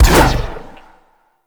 Jump Projection; Footsteps; WallRun; Warframe; Characters; Tenno

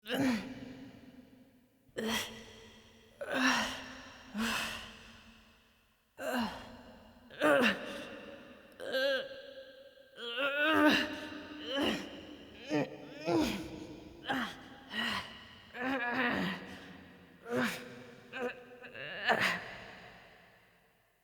Wake Up Vocalizations Female; Envoy; Cinematics; Bromius; Bromius Quest Wake Up Poppet

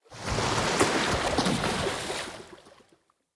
Corrupted Sap Waterfall Start; Puzzles; BeanStalk

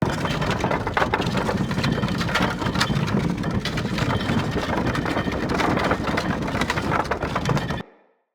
Drawbridge Loop; UnderCity

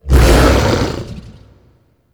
Axe Weapon Wind Up; Warframe; Weapons; Tenno; Wolf Frame

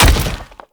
Step Default, Foley; Warframe; Sentients; Erra

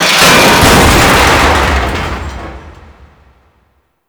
Infested Pipe Crash; Gameplay; Warframe; Corpus Infested

